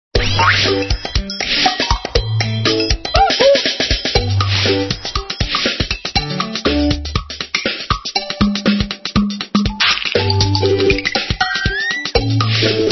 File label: sound loop; SpongeBob SquarePants Plankton's Pernicious Plot flash gamerip